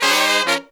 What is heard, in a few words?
Phantom Horns, 132BPM; 90 s Sample CDs; Zero-G